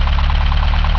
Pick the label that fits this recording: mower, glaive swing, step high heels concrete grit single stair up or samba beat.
mower